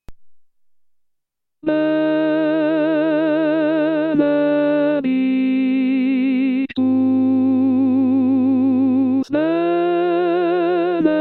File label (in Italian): tenori; Benedictus Perosi; Pasqua